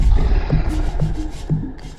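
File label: Music Soft Ground 120; from dust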